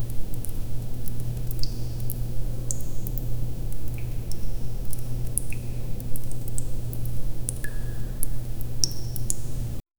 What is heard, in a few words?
slow muffled water drops; bathroom